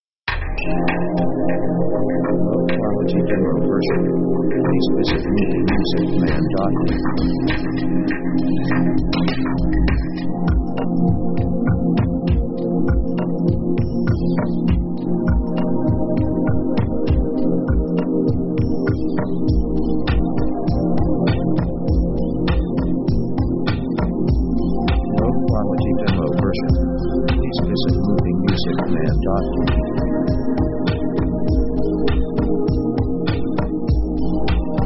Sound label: background; contemplative themes